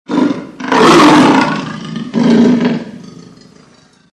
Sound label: lion; rise hunting; animals